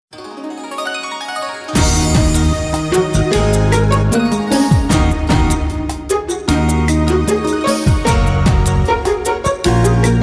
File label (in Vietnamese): Vong kim lang beat